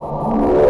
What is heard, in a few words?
gurp; quake2; eva-01